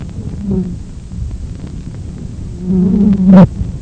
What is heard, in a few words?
HOUSEFLY; insects